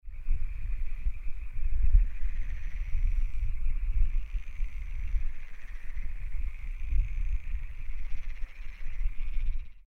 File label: lessonae